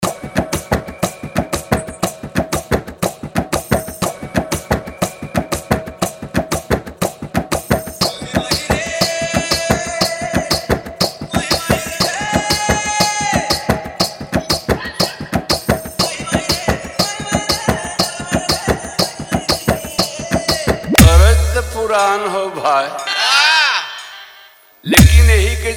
Wadding Special Song Hard Bass Full Dance Mix

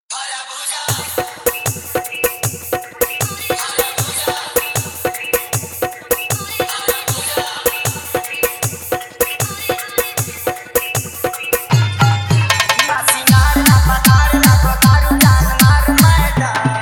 Insta Viral Old Bhojpuri Dj Song

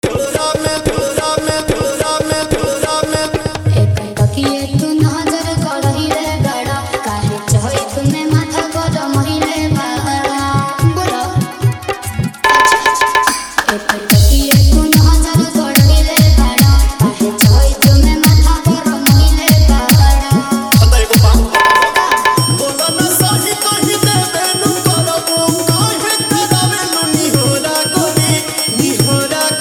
New Bhojpuri Dj Remix Song

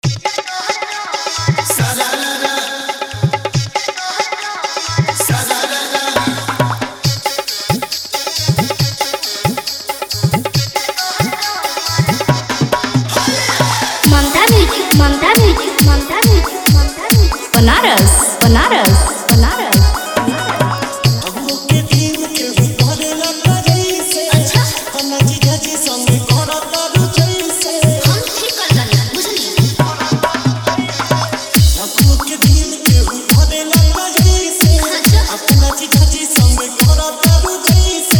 New Holi Dj Remix Songs

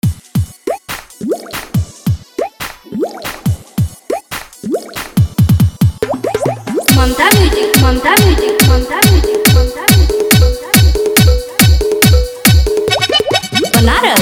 New Bhojpuri Dj Remix Song

